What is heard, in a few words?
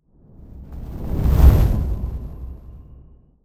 woosh